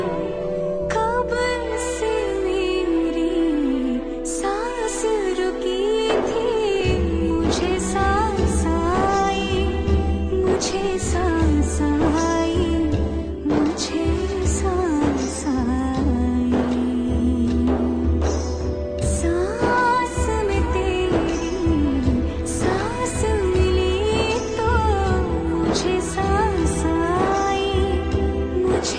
Sad

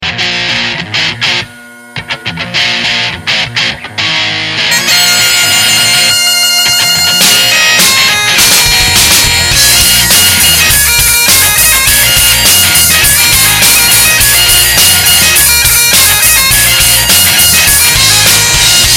Pipes

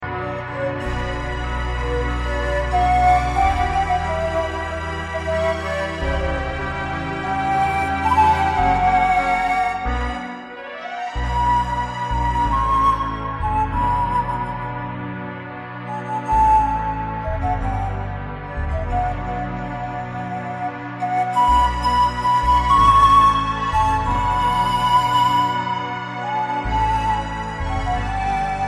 Music

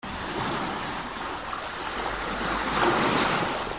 ocean

